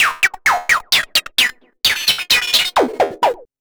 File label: Android Arps 130BPM